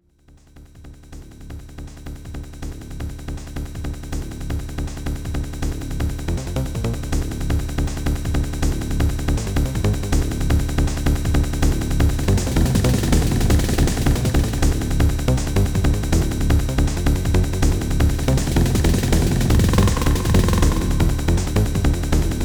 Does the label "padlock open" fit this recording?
No